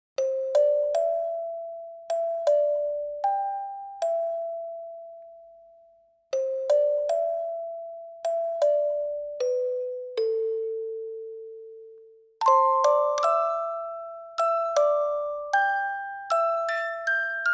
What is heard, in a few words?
Music Box